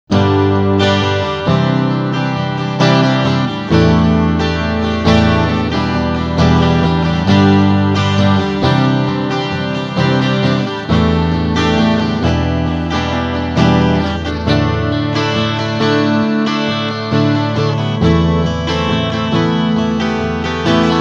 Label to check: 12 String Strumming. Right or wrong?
Right